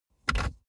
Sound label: car bmw e46 interior drink holder insert bottle soft